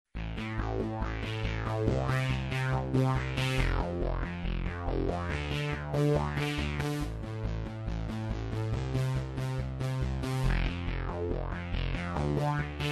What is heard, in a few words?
autowah keyboard